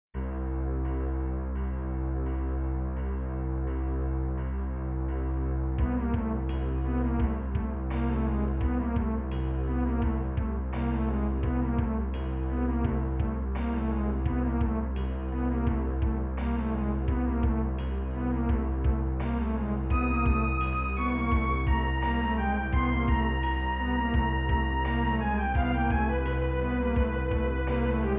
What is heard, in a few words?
Haunting